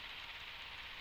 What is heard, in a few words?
Background Noise